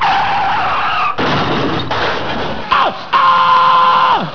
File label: CRASH